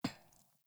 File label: Grunt Boot Walk Metal; Characters; Enemy; Melee Grunt; Footsteps; Boots; Sinecure